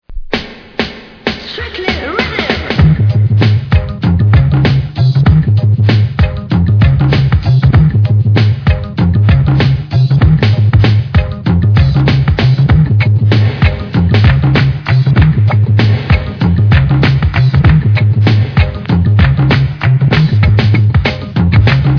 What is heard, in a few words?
beats